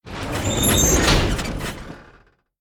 Safety Gate close

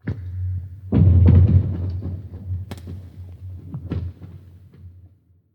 Random Ice; moul-assets

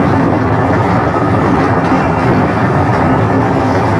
Reverse Timer Loop

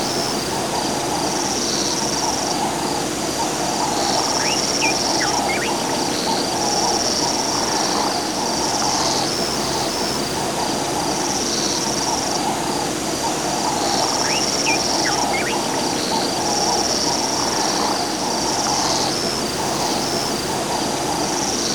Amb Loop; Eder Delin

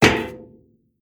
Metal Run; moul

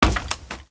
Wood Ladder

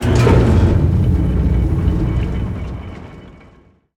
Weight Start

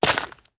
Stone Walk

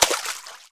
Water Run; moul

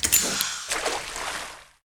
Pellet Drop End

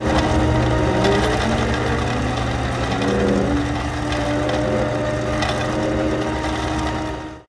Elevator Gear Start Stop

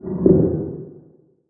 Random Rock

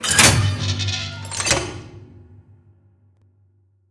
Pellet Flush Lever

